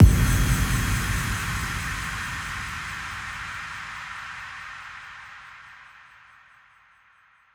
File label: Impact; 808 Spaß